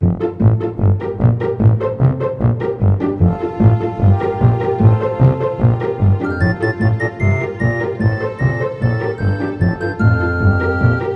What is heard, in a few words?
In Game Music; Animaniacs Ten Pin Alley; PS1